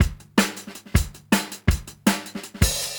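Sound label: 160 Standard DnB Hi Hat Clean, Live Jungle Breaks; LiveJungleBreaks Mini SP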